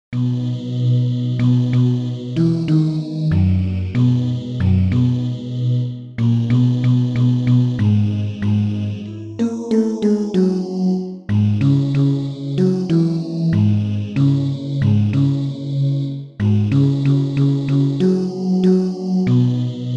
Welcome Bass, learning tracks

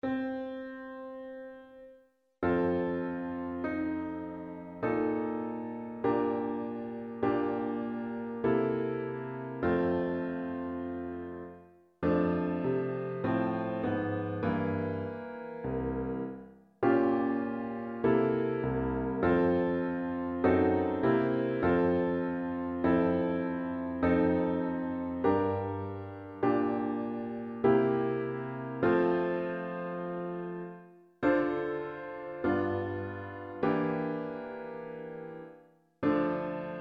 Backing

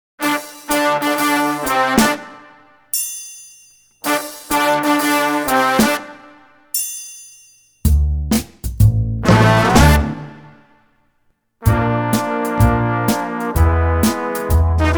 Instrumental Version

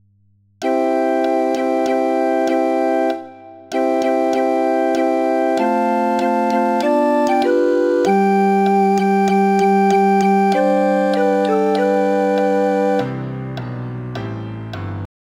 all synth; Gaudeamus

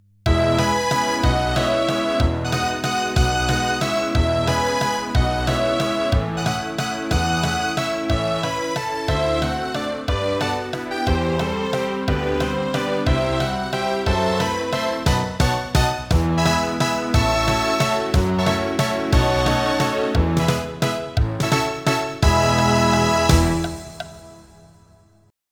Grand Waltz

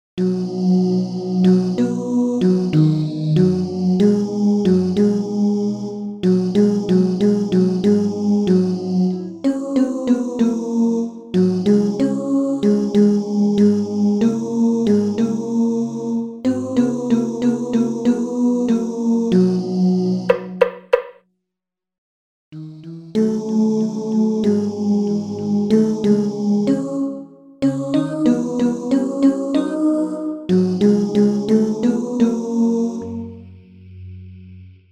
Welcome Baritone, learning tracks

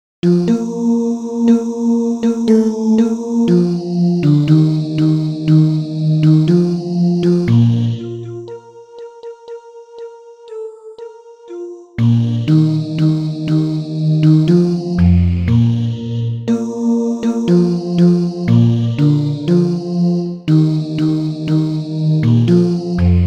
bas; Boar

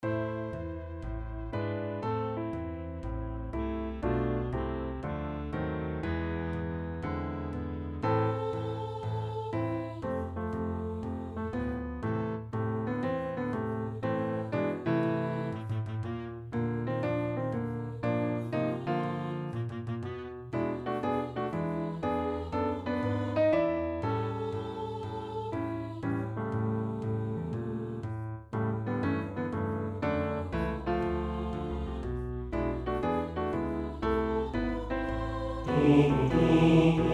Bass

